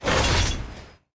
damage

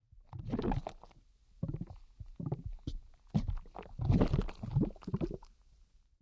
swamp, lake

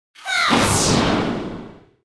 snowman attack

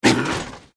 hounds damage

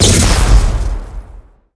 skill explo

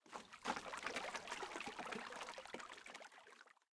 ferry, lake

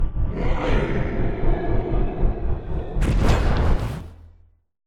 lesser demon death, monster

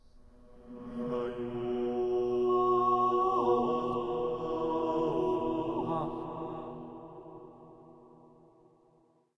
ruins, desert

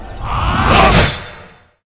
dark priest attack

